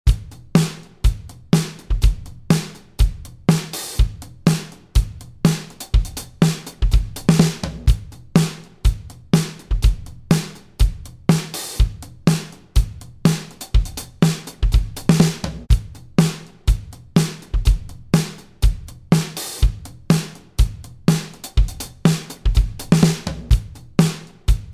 Disco Drums bypassed

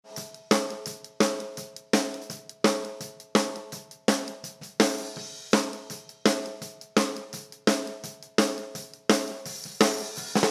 Snare bypassed

